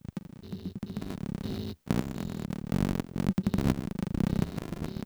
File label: 105bpm; elektron machinedrum